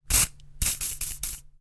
industrial water displacement spray from bottle aerosol